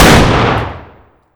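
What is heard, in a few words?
fire distant 338lm rifle interior; gmod; arccw uc commons attachments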